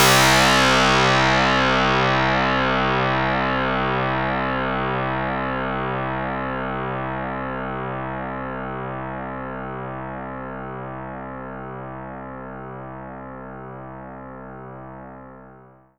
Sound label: string B chain Inside